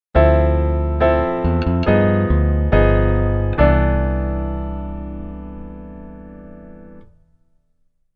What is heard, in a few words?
Keyboard Voicing Rootless